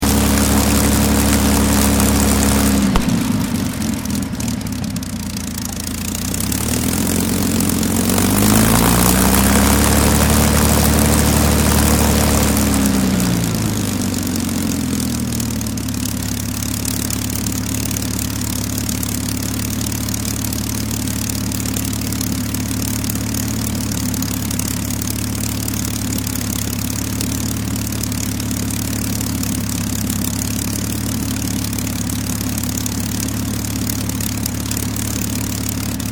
AIRCRAFT SPITFIRE ENGINE IDLE SHUTDOWN, Rolls Royce Merlin engine; Airplanes; Vehicles